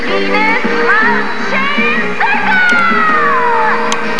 chain

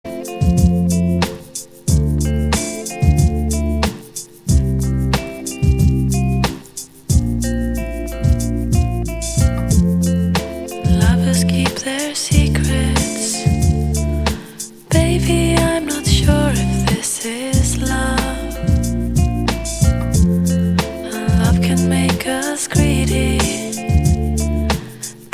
club baby

